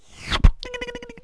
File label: thrw twng, cartoon; Wired for Sound Pro CD; Aristosoft, Inc.